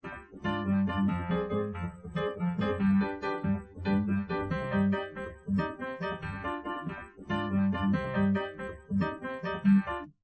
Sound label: drums; SiSEC11; underdetermined